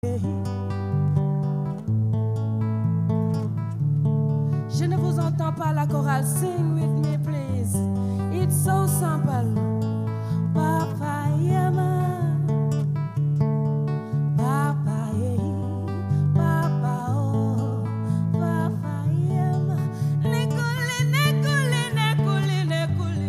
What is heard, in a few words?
Voice & Guitar